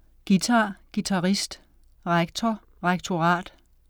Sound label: guitar; guitar, guitarist; rektor, rektorat